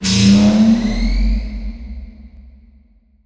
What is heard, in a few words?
teleport sound; overte